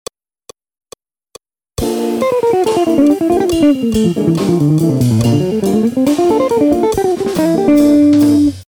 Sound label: licks Fast